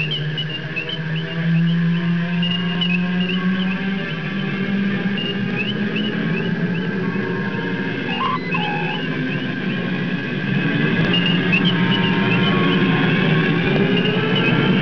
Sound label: warp fx